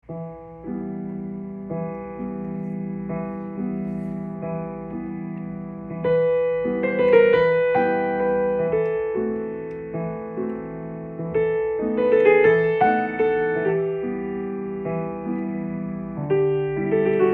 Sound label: Piano